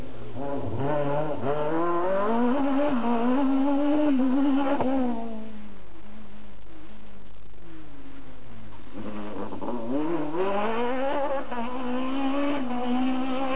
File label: megane, Megane maxi